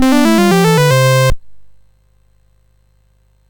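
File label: Number Tumbler, MOS 6581 SID chip music, games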